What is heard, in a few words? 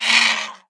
Dragon Whelp Wound